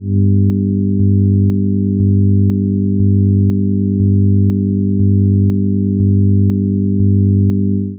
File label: podcast intro music loop; calculator kit